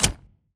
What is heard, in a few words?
boomerang hit